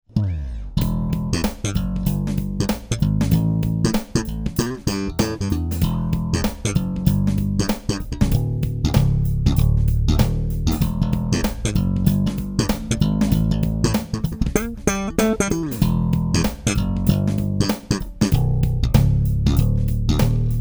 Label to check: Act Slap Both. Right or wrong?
Right